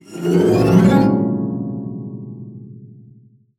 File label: GLISS, SPINET; Xsample VOL-7